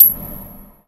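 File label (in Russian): кнопка сухая короткий звонкий высокий резкий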